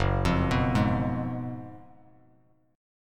F sharp Major 7th Suspended 2nd Suspended 4th, Bass Standard; arpeggiated; JGuitar